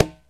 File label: CONGA SET; OMI - Universe of Sounds